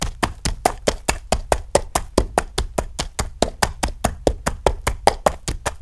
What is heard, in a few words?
donkey walk; birthday party bash; wii